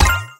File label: collect; pinball